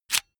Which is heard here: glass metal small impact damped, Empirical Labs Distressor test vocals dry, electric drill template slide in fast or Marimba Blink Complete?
electric drill template slide in fast